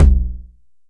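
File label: 909 low tom; Goldbaby Tape808 & Tape909; The Tape 909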